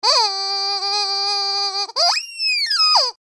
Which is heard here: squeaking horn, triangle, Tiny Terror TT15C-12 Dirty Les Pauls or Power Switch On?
squeaking horn